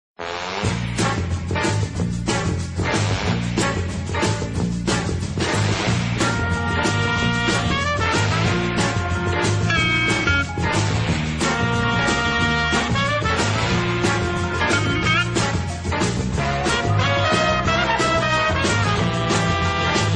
fire sale music

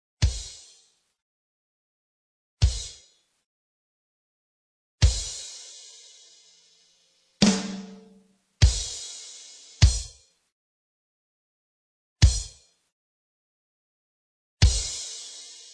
drum track

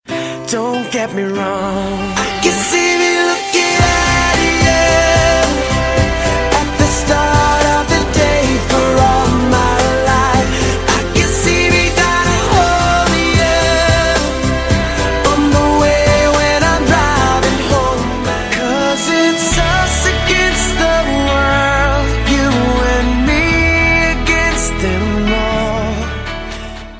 PIANO FEELING

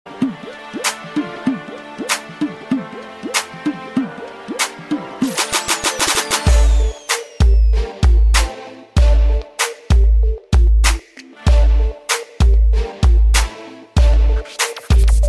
ample bass mix; hip hop

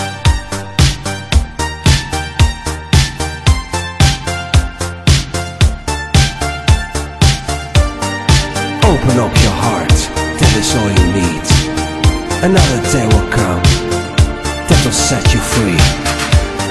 Melody

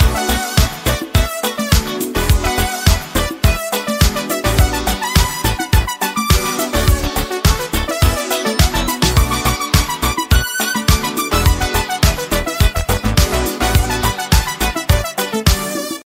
80s Halloween Tone

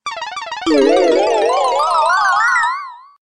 most funny sms; ringtones

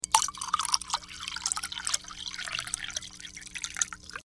water sound; ringtones

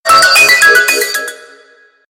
Alert; Nanobots

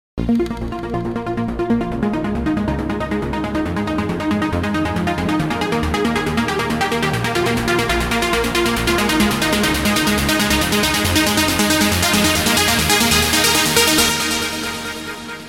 trance synthesizer

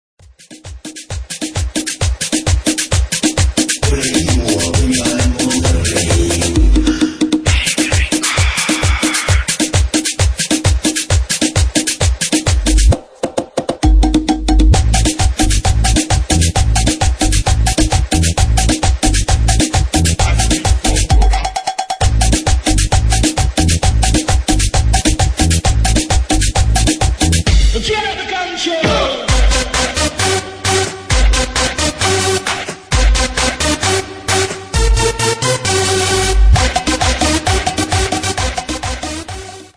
tribal vs perreo, ringtones

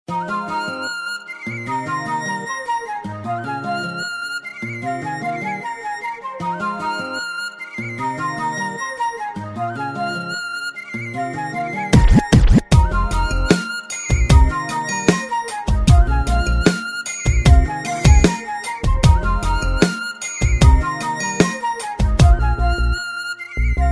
chinese flute music; classical

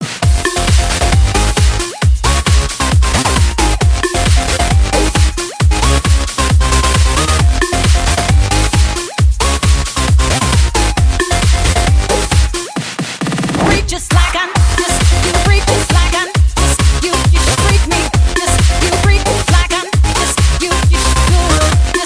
disco remix

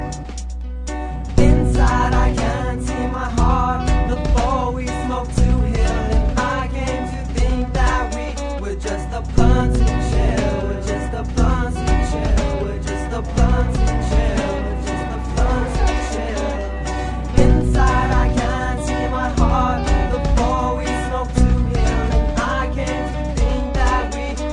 chill rythms, ringtones

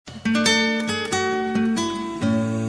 christmas sms tone; ringtones

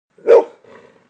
Dog Woof

